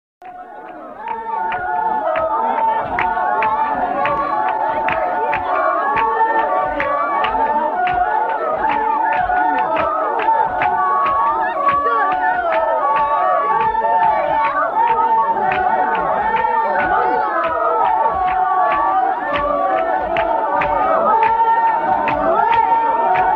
Juhoansi Giraffe Dance

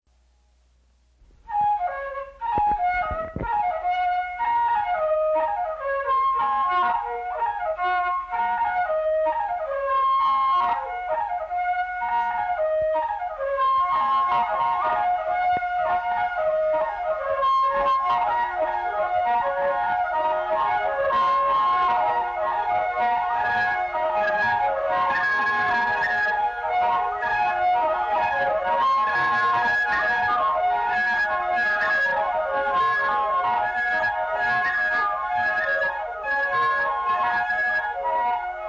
Anaguta Flutes

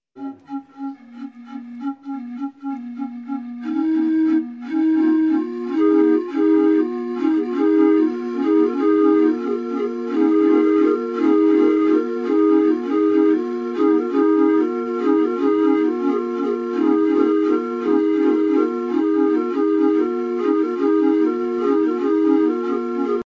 Vietnam Ede Music Flute Polyphony; Musique Du Monde

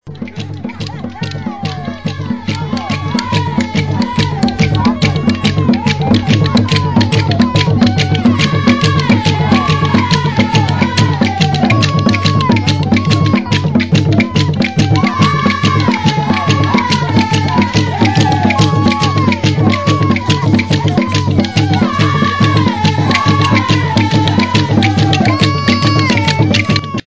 Khwe Bushmen Okavango; Mongongo

